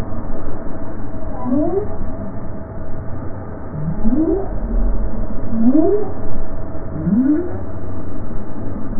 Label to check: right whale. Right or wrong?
Right